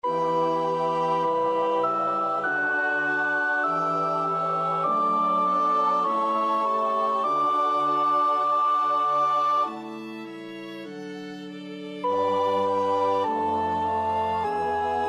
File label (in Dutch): sopraan, koorpartij oefening; Reger (Max); Meinen Jesum laß ich nicht